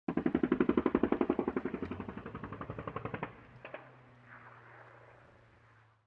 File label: ambient shot mono; War Thunder